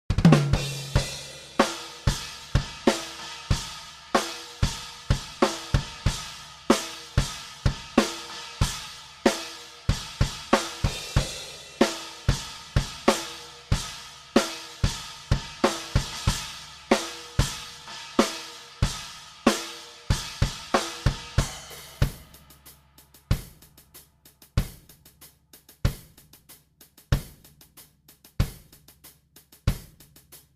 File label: drums